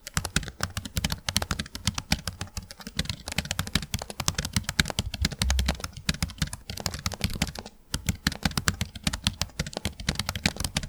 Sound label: old keyboard